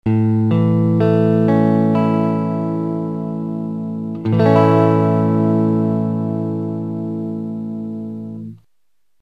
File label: a major, guitar